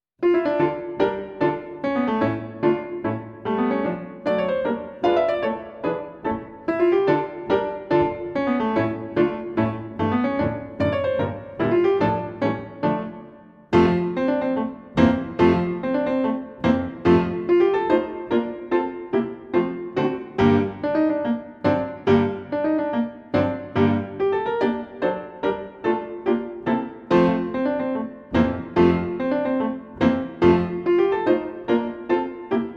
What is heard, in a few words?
Etude in D Minor; op 68, no 9; Ludvig Schytte; Celebrate Theory recordings